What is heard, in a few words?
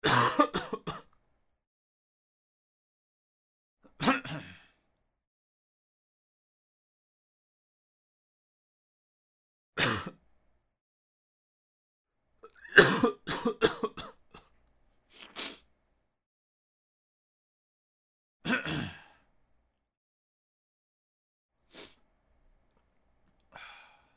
Male Coughs Throat; افکت صوتی